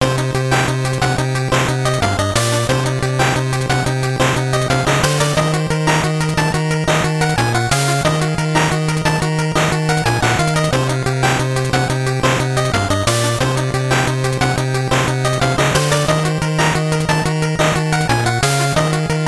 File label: Unused BGM; Power Rangers Lightspeed Rescue; GBC